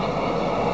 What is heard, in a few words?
Motor, Train; TW6000